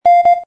Camera Timer, phonetones, LG A250 system

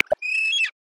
Magnificent Frigatebird, Galapagos; Xiaomi MIUI-14

